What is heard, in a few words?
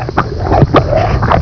beast eat; ANIMALS